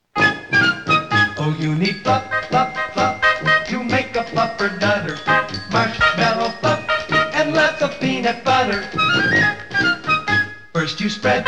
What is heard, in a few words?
Jingle